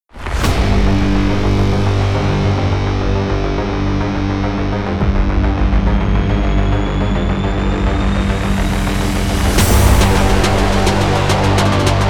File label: acid edm